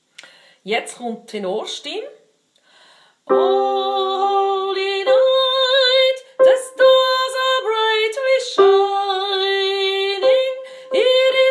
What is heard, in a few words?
O holy night Tenor